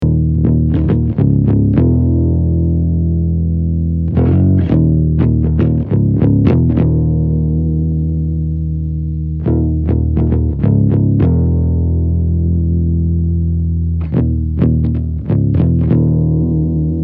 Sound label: bass